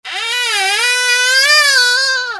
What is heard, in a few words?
SQUEAKS